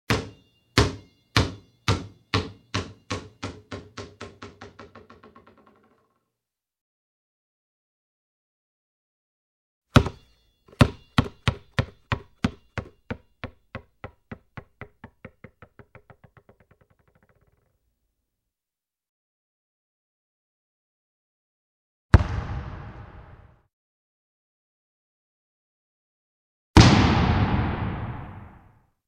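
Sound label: ball falling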